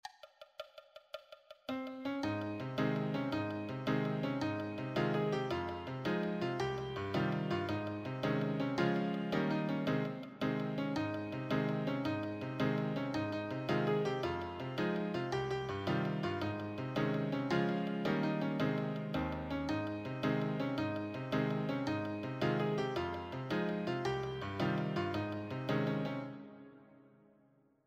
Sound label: solo, piano